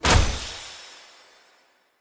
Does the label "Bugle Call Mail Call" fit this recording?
No